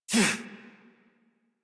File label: Pain Adult; Warframe; New War; Adult Operator